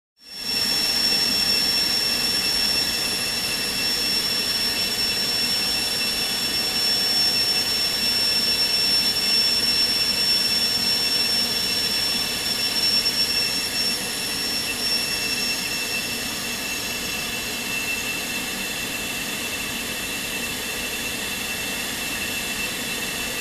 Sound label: Fighter Jet engine idle